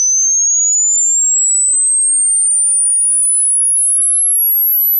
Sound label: gapless sweep